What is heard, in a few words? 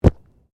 Body movement Impact Medium; Body movement foley to simulate falls and deaths; Wrestling and Struggling